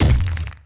gnome move; Akeron

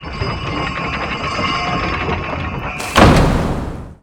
Door Close; Feria

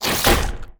Toxy Chain Scorpion attack; Karutan

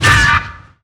attack; undine; Akeron

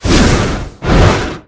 Rage Stamp; Ragefighter

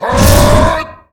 Dragonkick; Ragefighter

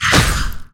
devil fairy hit; ubaid; Akeron

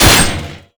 Spear Storm Hit; Kundun

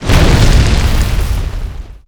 Mine Idle; Ferea; King

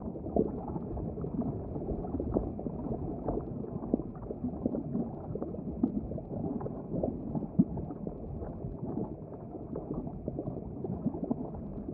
bubble; KardaMahal Underground Temple

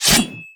Knight Attack; Ferea

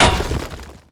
Rock Mine Attack; MUDevs S20.1-3

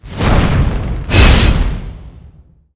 Curse Of The Wind; Lord Silvester

